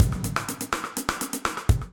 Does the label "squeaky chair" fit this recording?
No